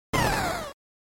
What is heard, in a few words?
NES Shooter Damage